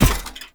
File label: King Step Default, Footsteps; Enemy; Mendicant; Mnd King